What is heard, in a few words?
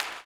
CLAP, Blues Soul; Spectrasonics Vocal Planet